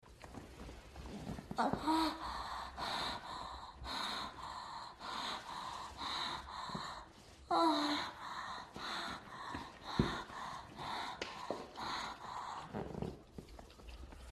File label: moan, moans; The Witcher Hunt